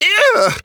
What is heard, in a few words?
Arcane Mage hit